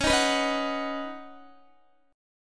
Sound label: Db 7th Suspended 2nd Suspended 4th, Guitar; x,16,13,16,15,13; Db7sus2sus4 strummed